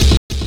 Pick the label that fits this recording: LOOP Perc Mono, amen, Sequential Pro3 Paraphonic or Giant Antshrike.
amen